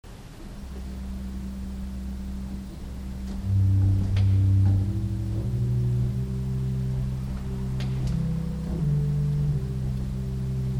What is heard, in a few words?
Organ Pedal Principle8